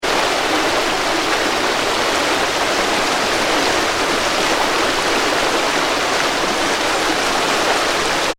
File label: water river shallow fast; Fast Flowing; Nature; McKinney Sound